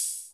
hihat sound g sharp key, Loudest frequency: 12094Hz